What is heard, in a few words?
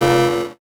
Buttons Mechanical